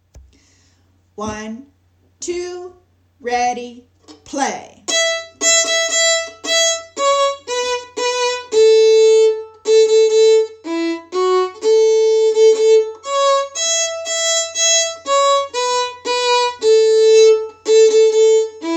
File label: Key of A